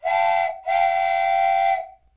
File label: Train Whistle